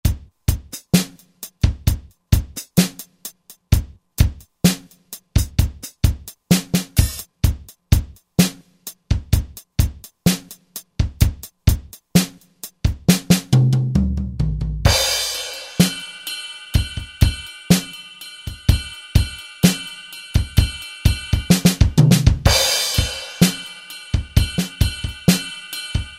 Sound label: Country Pop Set; CntryPopSet1; Roland SR-JV80-17 Country Collection